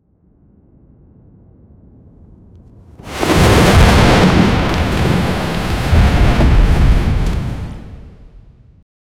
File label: epic soundscape enormous, dragon